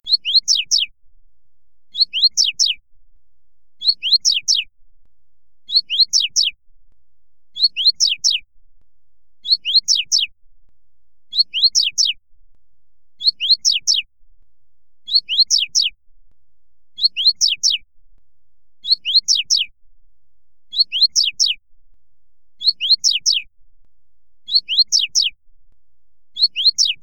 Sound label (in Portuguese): Canto de Coleiro Tui Tui Fibra